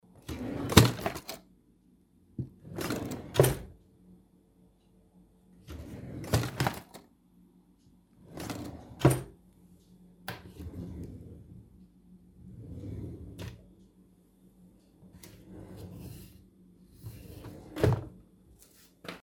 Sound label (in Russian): jashhik s lekarstvami